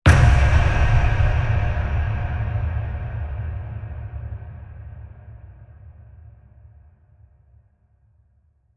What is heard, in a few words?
Shock Hit Deep; Ninja Tracks